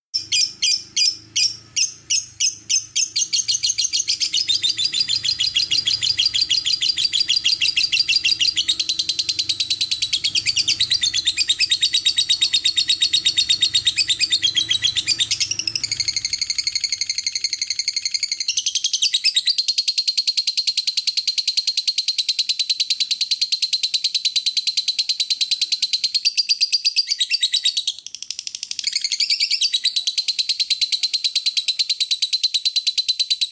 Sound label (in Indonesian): suara lovebird langsung nyaut